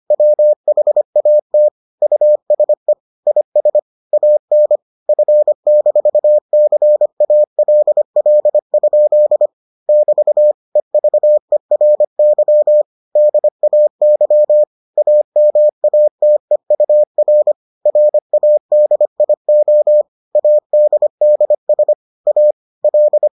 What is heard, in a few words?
morse